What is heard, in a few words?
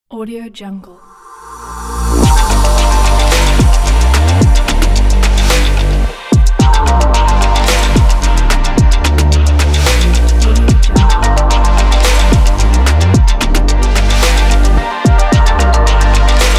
abstract technology intro logo